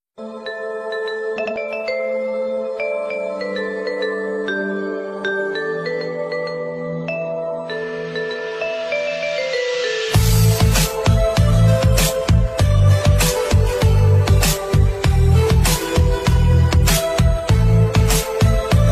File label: ringtone, Bangla ringtone; অসধরণ একট বজন রটন